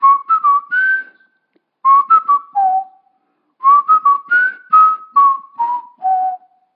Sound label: whistle; talking ben